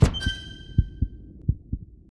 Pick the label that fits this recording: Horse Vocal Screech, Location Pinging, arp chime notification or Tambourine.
Location Pinging